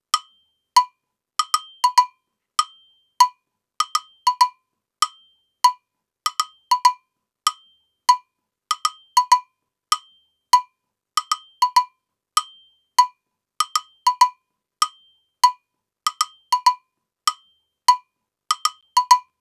baiao 98 agogo; gen 04 stems samba baiao

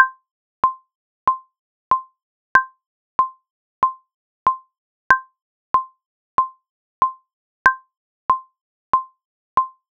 samba 94 metronome; gen 04 stems samba baiao

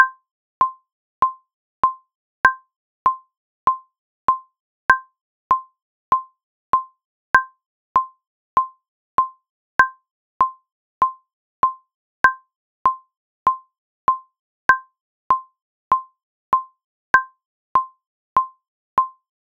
baiao 98 metronome; gen 04 stems samba baiao

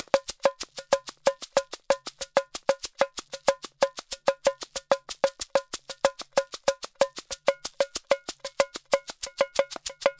samba 94 ganza tamborim; gen 04 stems samba baiao

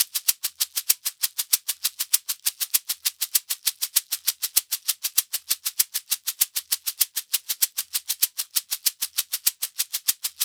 baiao 98 ganza; gen 04 stems samba baiao

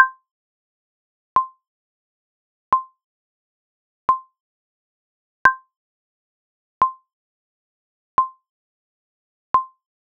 choro dolente 88 metronome

